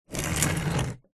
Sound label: industrial heavy duty plastic trunk or object move slide scrape on lightly gritty; zapsplat